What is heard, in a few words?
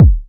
BD, Waldorf Blofeld